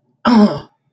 Human sounds Human voice Grunt, vocal imitation

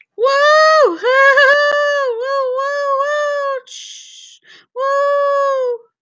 Human sounds Human voice Shout Whoop

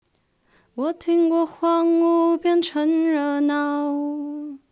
Alto

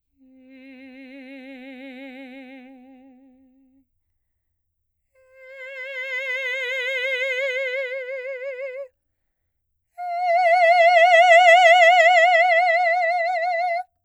long messa e

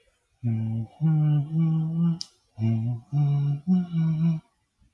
Human sounds Human voice Humming, vocal imitation

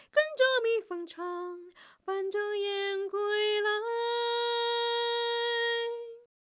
Soprano

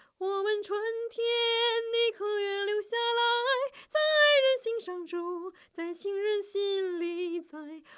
Soprano

